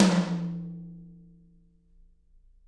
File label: TT, Toms; Bob Clearmountain Drums I